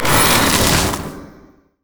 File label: sap magic melee heal; maokai